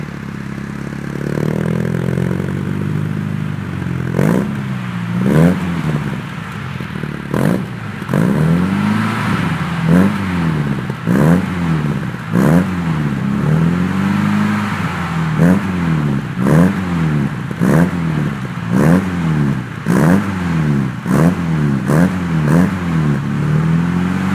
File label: Sound bmw 2000 CS, exhausts